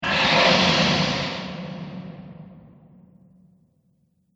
Fire Breathing Monster; Monster Sound Effects

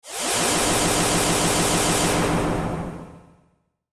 Sci Fi Airy Ray Beam Vintage; Laser; Lasers

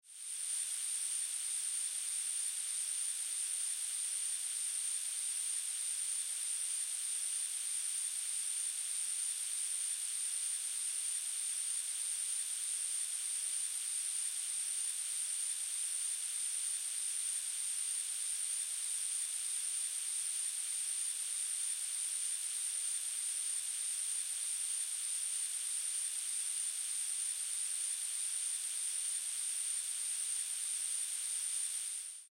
Industry Air Can Expel Slow, hisse; Hisses

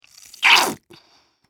Lotion Bottle, Foley, Lotion, Bottle, Squirt; Primping; BlastWave

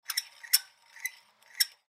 Ship Pulley System Squeak Shake; Transportation Watercraft; Boat Sound Effects; Blastwave

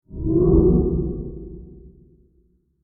Deep Scary Transition; Scary Soundscapes; Horror; Sound Effects:Horror; CraftedSignals

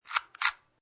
Lock

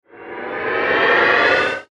Ascend Vacuum Swell; Blastwave

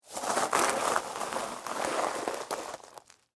Scrape Ramen Noodles; Texture; Scrapes (Sound Effects:Objects); BlastWave

